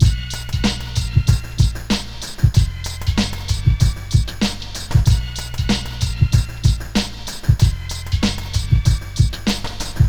Beat 095, Hip Hop

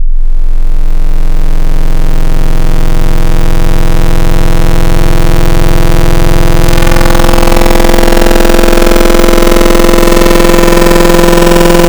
Sine Crunch, FM Wavetables; Cymatics; FM Wavetables Vol 2; Serum

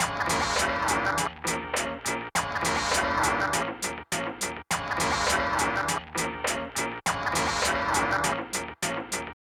Synth FX Combo; AppleLoops

